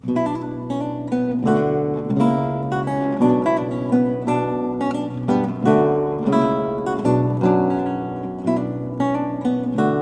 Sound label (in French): Suite en Do mineur Menuet, Lute Baroque; C Mouton